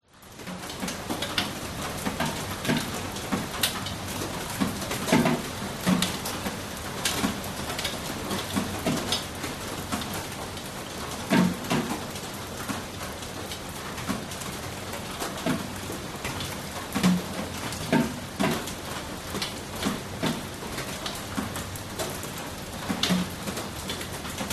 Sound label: rain medium drips tapping on bucket and other things bali indonesia; lukas tvrdon